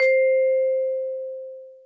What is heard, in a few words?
VIBE C5, VIBRAPHONE; Greytsounds VOL-1